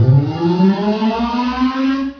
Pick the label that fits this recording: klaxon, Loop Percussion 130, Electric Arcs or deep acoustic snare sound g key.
klaxon